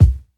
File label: clear bass drum one shot b key